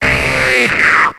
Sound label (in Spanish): Grito de Metapod